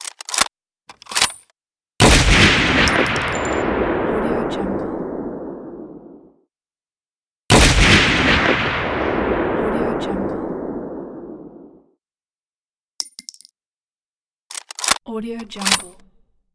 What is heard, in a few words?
sniper rifle reload shot; back17ground